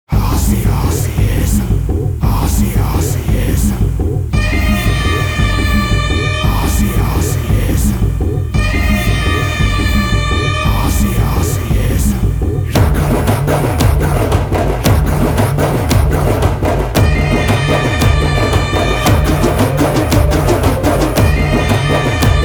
Instrumental

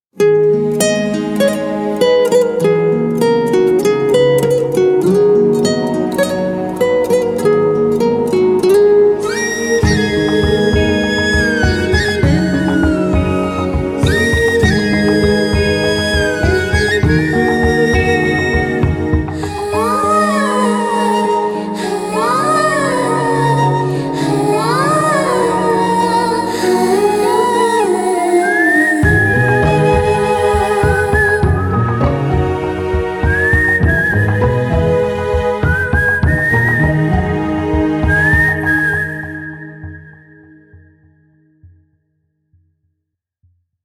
Whistle of Love

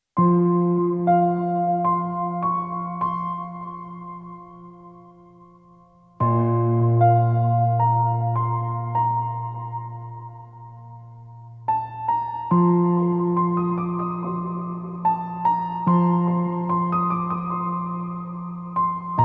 Theme Piano

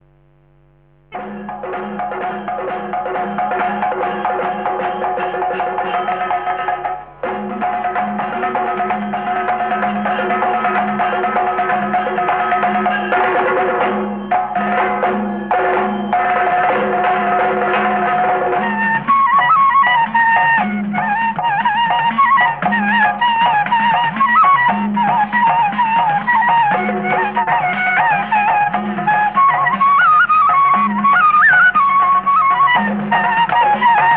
Dance Sequence Instrumental

